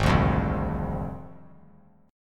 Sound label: Ab 6th Flat 5th Ab; strummed; JGuitar